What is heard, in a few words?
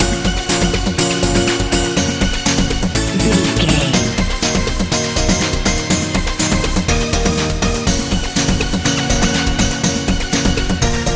powerful warm big wind sprint life modern dance